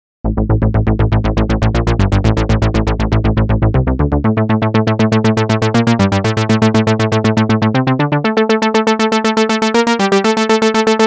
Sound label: synth